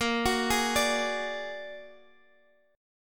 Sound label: A sharp Augmented 7th, Guitar DADGAD; 20,21,18,19,x,x